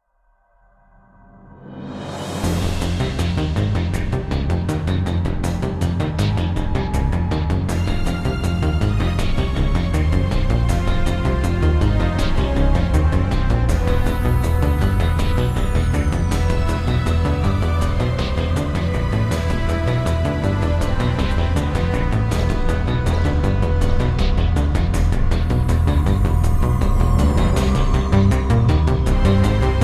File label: Heavy Mech Fight; mass effect 2